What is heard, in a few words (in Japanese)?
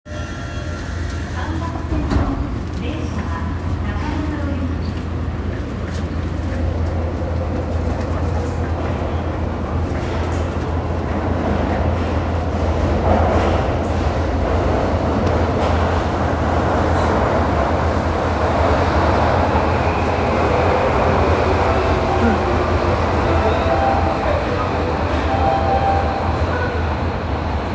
日比谷線霞ヶ関南, train sounds